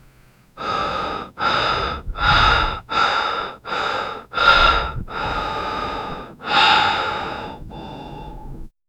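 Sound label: running with a deep breath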